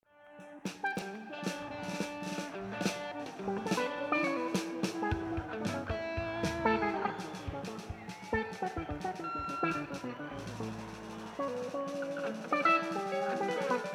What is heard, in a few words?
Tuning